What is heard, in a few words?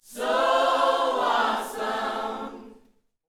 Vocal, Gospel, PHRASE; Spectrasonics